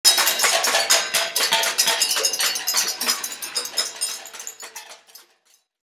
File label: the storm